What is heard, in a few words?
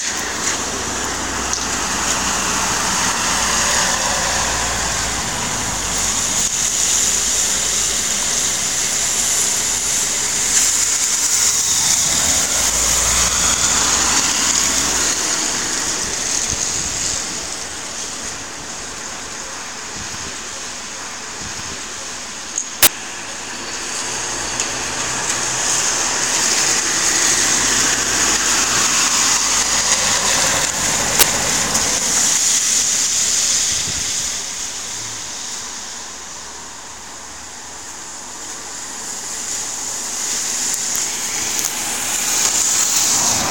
welsh town ambience